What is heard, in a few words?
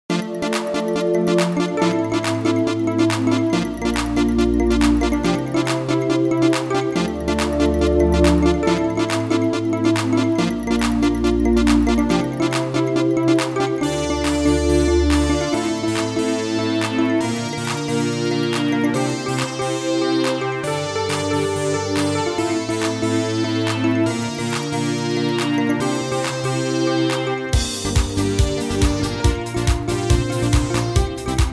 toys karaoke